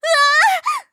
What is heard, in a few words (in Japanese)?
"うわああああ！", 騎士; ハイグレボイス集